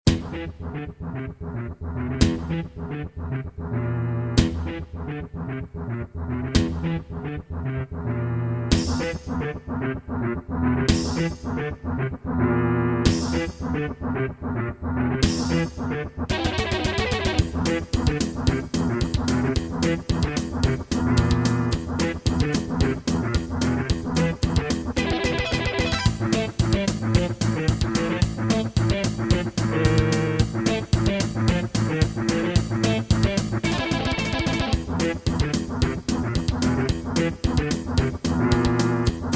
Creative Wave Blaster II; Doom

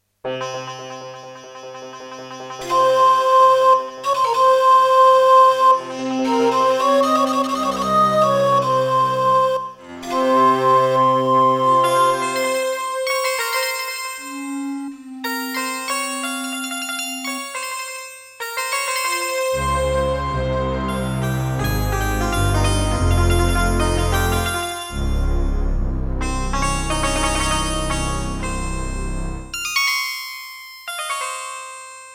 Intro, Soundfont; Prince of Persia 2

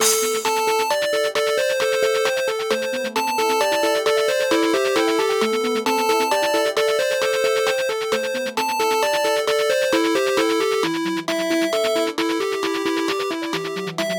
Alesis Harmony 32; System Shock

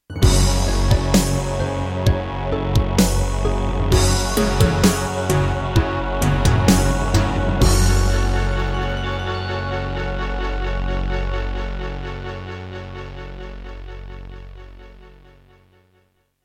MidiPlus MiniEngine USB; sigil doom episode 5

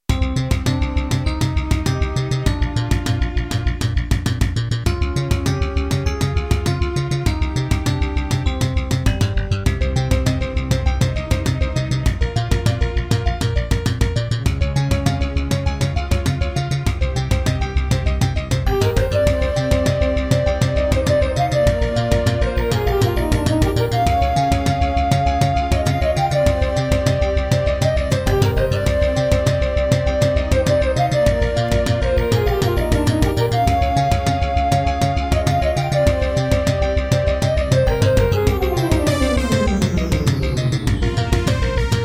Introduction, Miditemp DoX-1; Hocus Pocus

